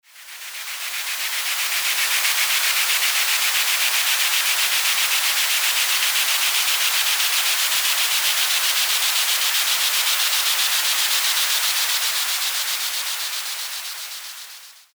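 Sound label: scrubber v5, misophonia tinnitus noise masking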